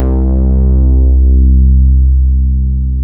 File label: MODULAR C3M, MODULARBS